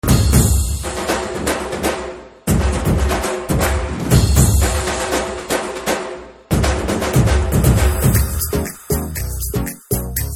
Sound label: BG Drum Beats; Brindavanam; Rowdy Boys